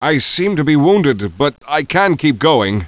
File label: wound; svencoop; bshift; rosenberg